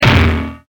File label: Bonk